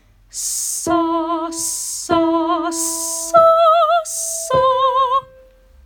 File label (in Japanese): Sa で ミ ミ ミ ド